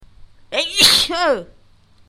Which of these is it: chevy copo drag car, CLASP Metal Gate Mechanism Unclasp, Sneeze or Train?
Sneeze